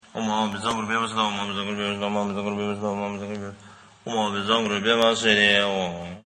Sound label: Om A Hum Vajra Guru Pema Sidhi Hum